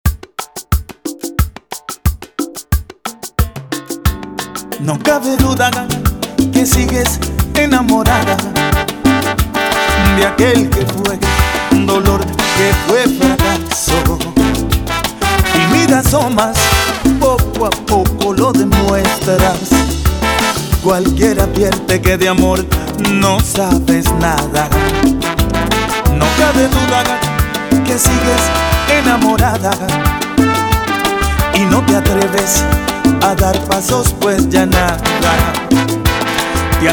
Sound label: 90bpm, Intro Outro